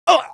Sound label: damage, pain; mohaa; dialogue